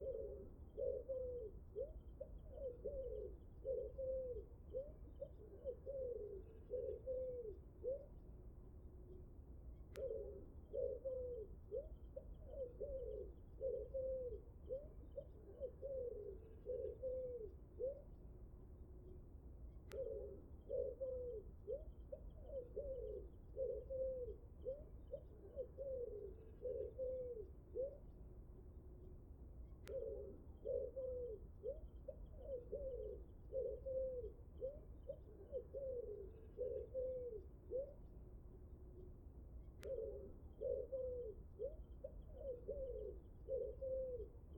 pigeon ramier

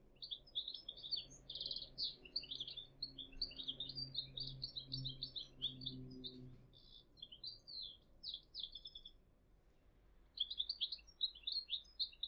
chardonneret elegant

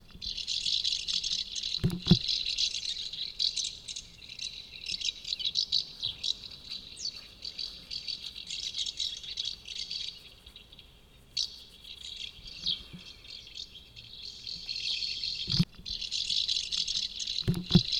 hirondelle de fenetre

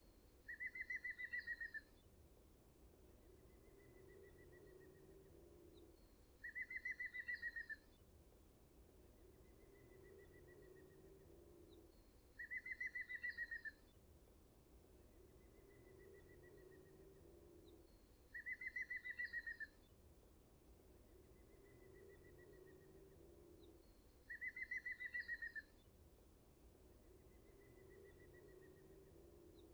pic vert

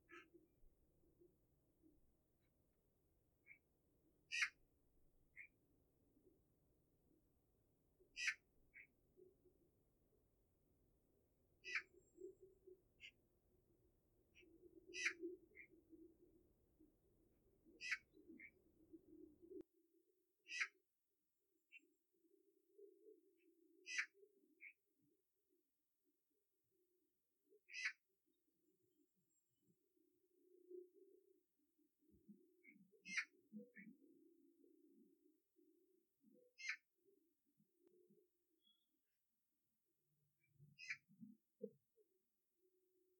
pie bavarde

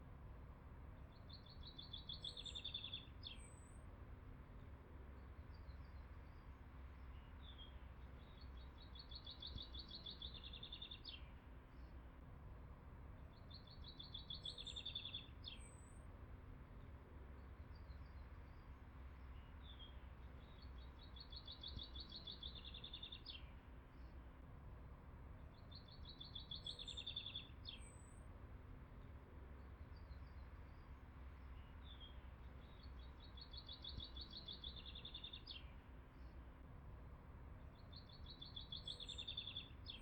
pinson des arbres

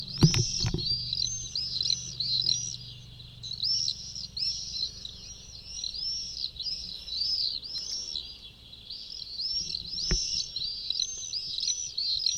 martinet noir

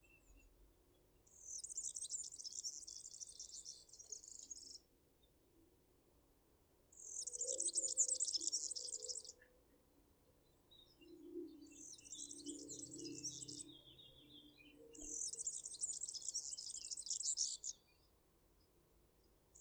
serin cini

